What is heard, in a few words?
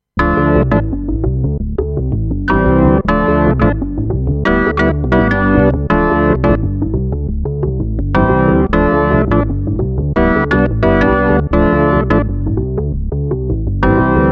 Organ